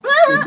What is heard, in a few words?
ah ha giggle